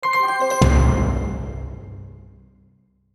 Logo Stab Twinkle Low Drum Hit; Sonic Logo Sound Effects; Production Element Imaging Element Accent Transition

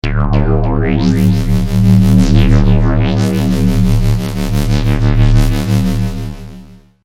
Ufo, Bean, Ray, Vintage, Science Fiction; 5.1

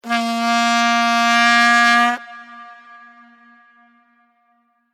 Single South African Vuvuzela horn blast

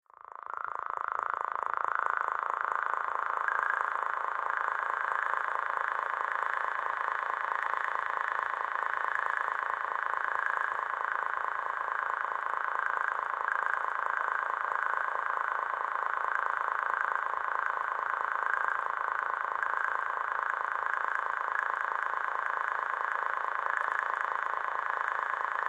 Alien Crickets Otherworldly Ambience Pad

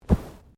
Bodymovement Clothing Slide; Body movement foley to simulate falls and deaths; Dressing; Human

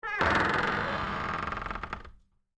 Door Creak Wood, Foley, Door, Creak, Wood; Creaky Doors; BlastWave

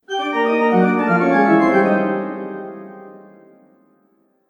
Haunted Organ Failure Sound; Musical

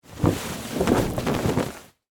Canvas Tarp Shake, Foley, Canvas, Tarp, Shake; Rustling; Objects; BlastWave

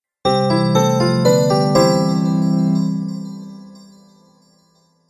Game Award Logo, Video Game Sound Effects; Twistedtracks

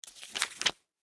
Office Book Hard Cover Page Turn Short; Paper (Sound Effects:Offices)

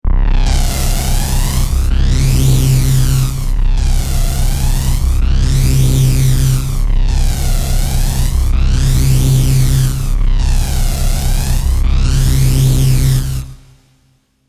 Throbbing Power, Pulsating throbbing energy flow; Electrical; Sound Design

